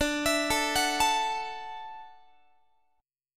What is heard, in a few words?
D-Major Add 9th, D, Guitar, 4A, 4E, 5A, 5D, 5G sharp, 6C sharp; arpeggiated; tuning=4A,4E,5A,5D,5G#,6C#